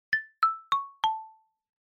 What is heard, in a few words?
Chime Announce (4 Tone Fast Down)